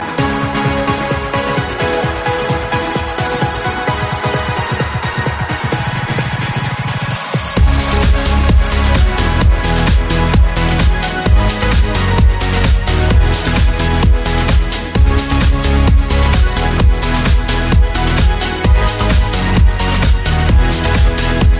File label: Guitaro